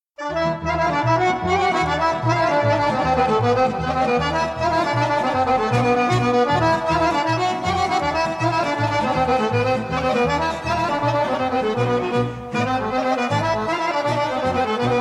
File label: bourgasko horo, Bulgarian folk songs and dances